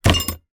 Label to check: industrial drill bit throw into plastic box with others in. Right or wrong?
Right